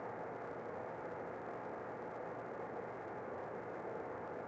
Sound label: palace hall ambience; Star Wars Obi Wan; XBOX